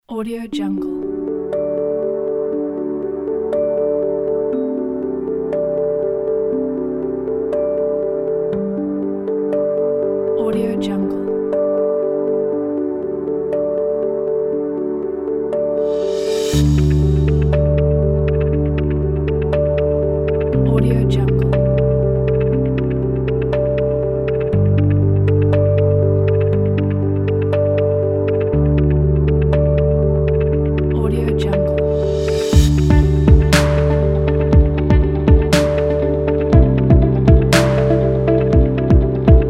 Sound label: hopeful ambient